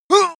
male hit huh

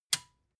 metal button release power off

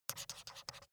marker pen write paper short

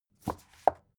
book shelve

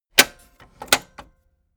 tape deck close clatter

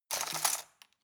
cutlery plastic multiple drawer grab hard

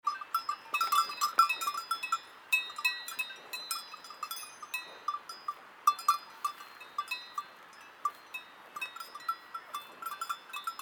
cows in the mountains

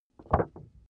paper wobble high short

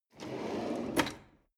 drawer medium close draw short

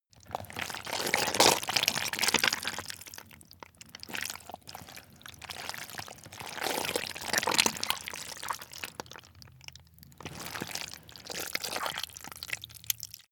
intestines handle

